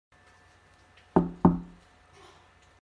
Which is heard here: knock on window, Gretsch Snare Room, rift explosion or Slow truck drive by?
knock on window